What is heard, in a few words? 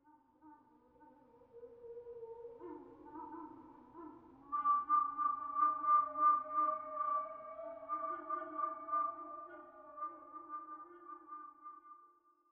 Scary Scratchy; the last of us